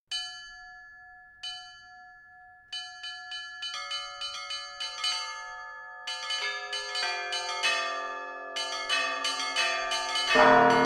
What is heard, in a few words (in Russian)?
perezvon, Kolokola; Holistika